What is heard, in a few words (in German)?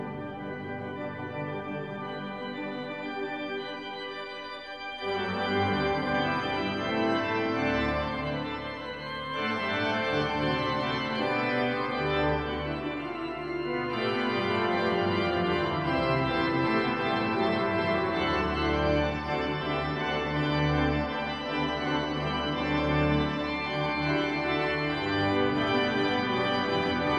Orgel